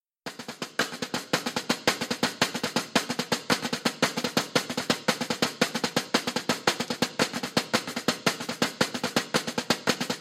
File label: batucada